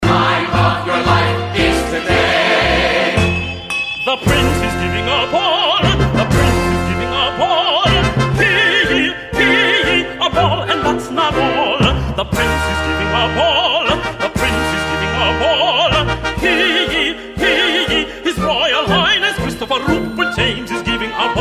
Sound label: TENOR SOPRANO